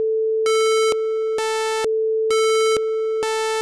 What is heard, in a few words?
4x Osc 130 AAAA